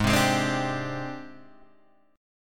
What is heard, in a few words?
G sharp Major Sharp 11th, Guitar Half Step Down; chord